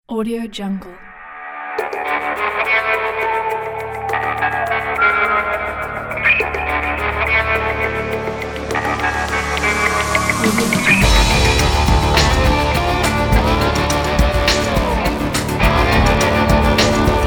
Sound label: dark western jingle